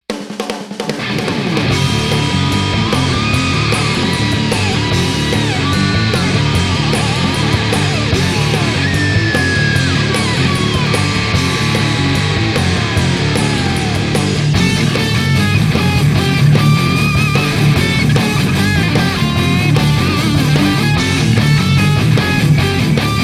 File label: Heavy Drive